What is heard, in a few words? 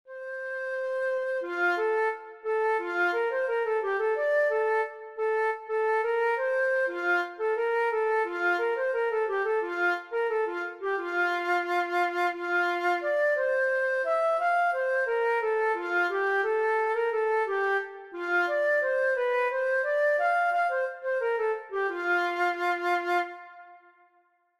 Flute; R6 吹奏楽部 卒部式